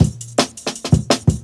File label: Jungle Breaks